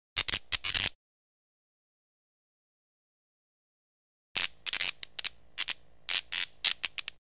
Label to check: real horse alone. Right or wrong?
Wrong